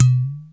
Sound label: Udu, dry perc, Quasimidi Rave-o-lution 309